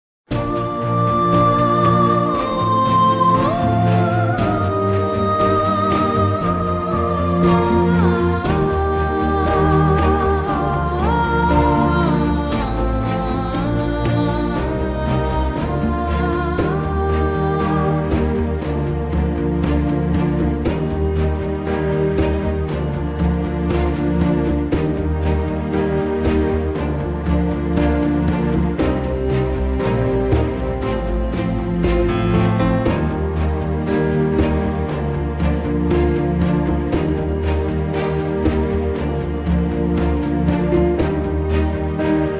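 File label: Bb orchestra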